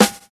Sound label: crisp steel snare drum sample g key, snare sample tuned to the G note; snare drum samples